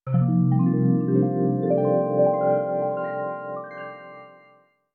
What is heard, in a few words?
Fanfare; primal image vol. 1 2000 playstation 2 gamerip